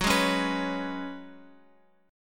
F sharp Suspended 2nd Suspended 4th, Guitar Open F; strummed; x,x,6,3,4,6